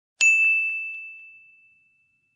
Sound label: ding